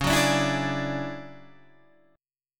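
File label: D Major Sharp 11th D flat, chord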